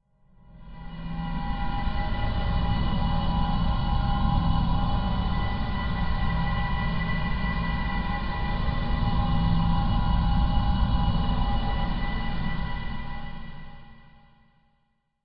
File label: dusty pad; Atmospherics